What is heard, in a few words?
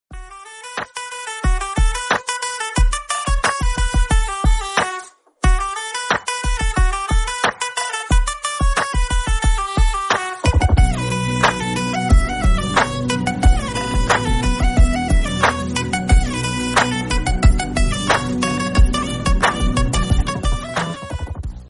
nicetone telugu; ringtone